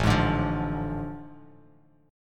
A Major 7th Suspended 4th Sharp 5th, Bass Standard; AM7sus4#5; strummed; JGuitar